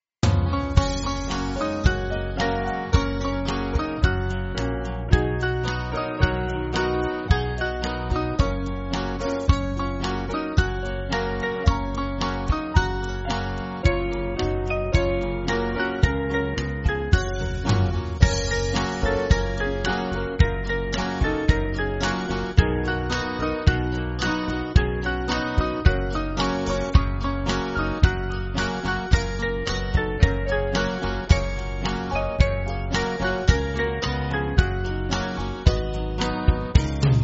Country Band

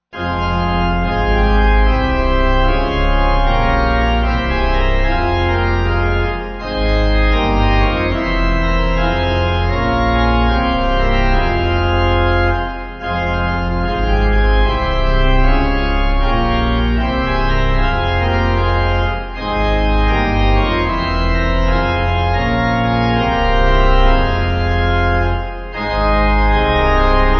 O The Deep Deep, Pipe; Organ; 3/Fm; Ebenezer

